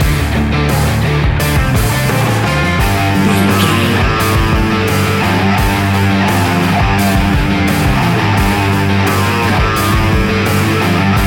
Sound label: Electric Rock Rampage, A rocking music track with rock guitars, heavy rock drums and thumping rock bass lines. Heavy, loud, aggressiv